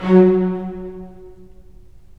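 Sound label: STR, strings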